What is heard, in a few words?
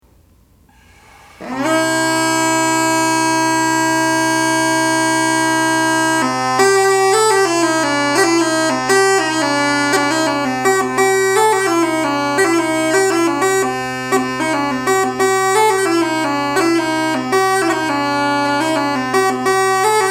pipes, canadian